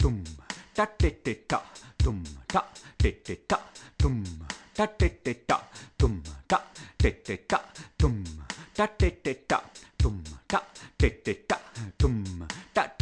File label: dum